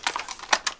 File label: scout inspect; czero opforce